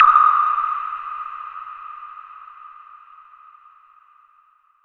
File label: Tonal FX D#